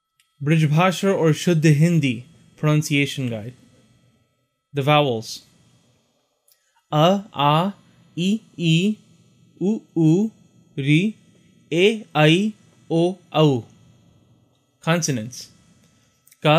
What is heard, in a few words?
brajabhasa